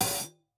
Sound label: hat open close